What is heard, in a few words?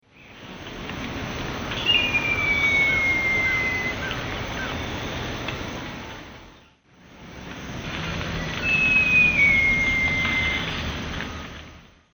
Jacamerops aureus, song; S. America; Eastern Lowlands